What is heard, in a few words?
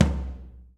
tom, drum; Masterbits - Total Zone